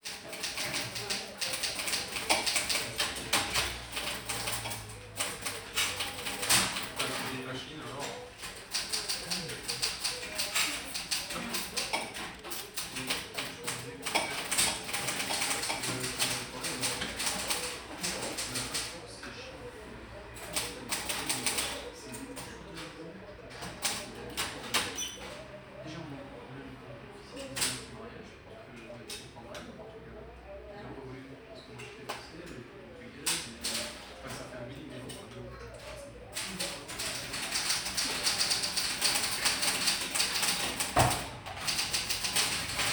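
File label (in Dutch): politiebureau schrijfmachine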